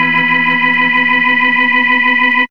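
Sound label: ORGCHD, organ chord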